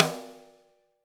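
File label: snare drum, Drums; Bob Clearmountain Drums I; SD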